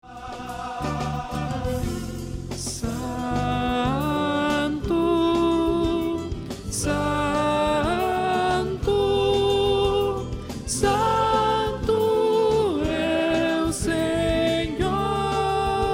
Santo Shalom Contralto

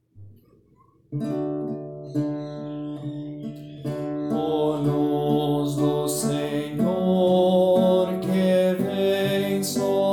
Ó Luz do Senhor baixo; frei luiz turra

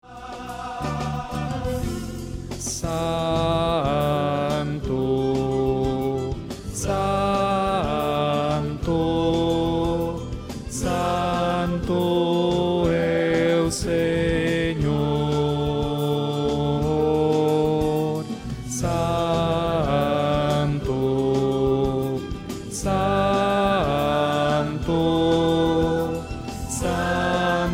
Santo Shalom Baixo

